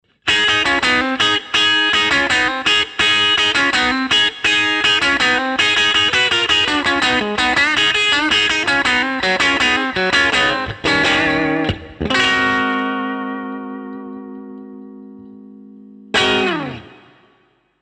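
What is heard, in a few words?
Strat60s bridge Vibroverb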